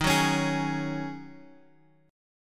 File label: Eb Minor 11th Eb down Guitar Open F; Ebm11 strummed